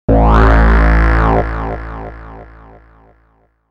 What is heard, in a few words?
Bass Drone